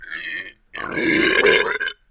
wetty fart

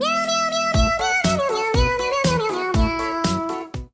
meow sleigh ride

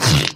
wet fart

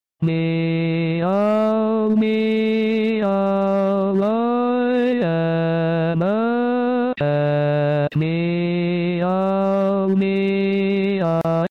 meow sad toy cat

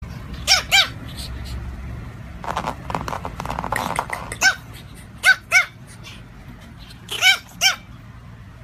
tiny dog barking

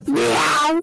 cats meow

